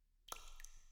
spill

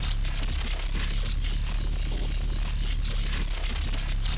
Drone Double Shot Fly Loop; TANK; 3Souls

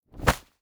monk strike; Shelter

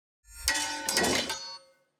ui battle begin; Shelter

